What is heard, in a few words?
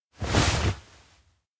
reach hand; anemoi